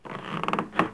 Boat Creak; Civ4

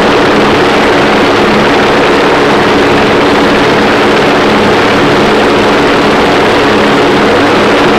engine bomber mid; War Thunder

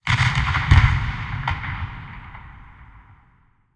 art start fire, tanks

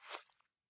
walk grass low; Escape from Tarkov

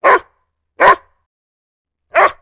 Dog, port; World of Tanks Blitz

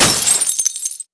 glass

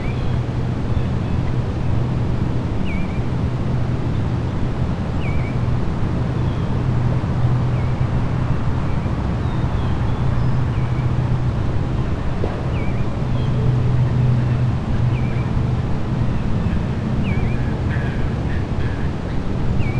dron; World of Tanks Blitz; hangar blitz

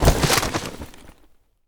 bodydrop concrete; Escape from Tarkov

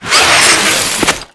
rappel push off

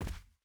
footstep barefoot cloth jump land; Rust

